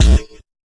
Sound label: fx drum